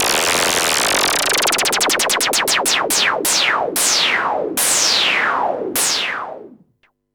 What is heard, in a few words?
Yamaha CS5, Filtered Noise